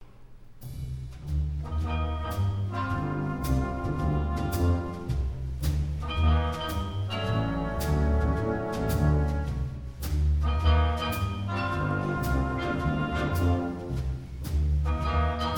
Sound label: Blue Pipes